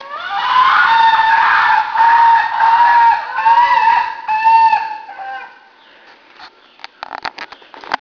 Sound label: long call